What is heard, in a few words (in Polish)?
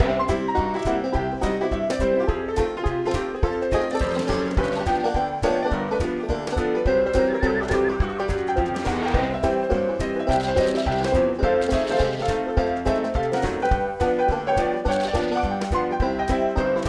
muzyka w grach dziki zachód